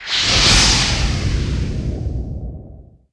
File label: Burn; Uru Town Chief Adrien; Seer; GamigoNA